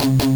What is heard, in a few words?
Distorted Guitar Shred Double; Genesis